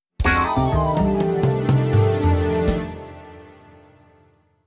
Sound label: Glider Failure Jingle